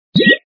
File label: power up; Ataque de Esporos